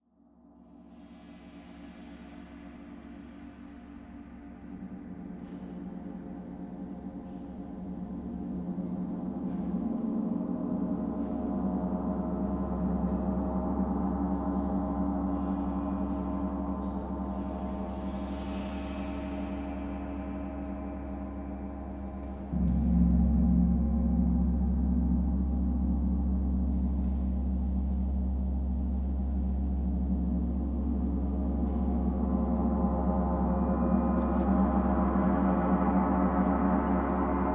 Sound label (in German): Gong Improvisation, Konzert im Bonner Muenster